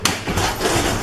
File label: door Unlock, Metro